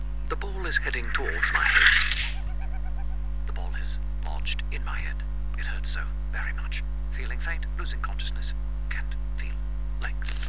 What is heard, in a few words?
ball